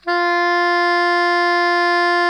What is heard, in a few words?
SOPRANO F 3, Saxophones; Greytsounds; Philip Wolfe David Crozier's Saxophones